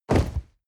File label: nylon leather bodydrop hard wet